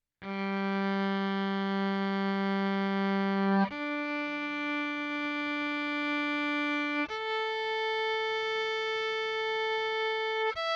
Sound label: pickup direct